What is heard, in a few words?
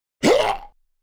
Voices Zombie Hit Groans